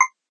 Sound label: number pop on